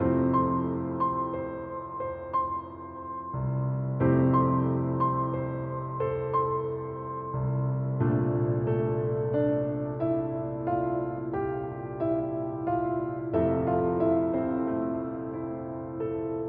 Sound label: piano solo; Final Fantasy XIV - A Realm Reborn